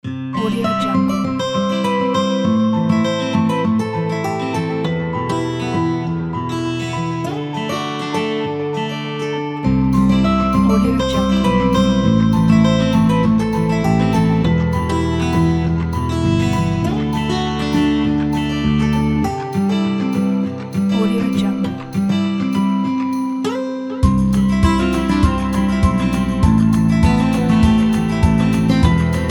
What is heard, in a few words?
folk acoustic guitars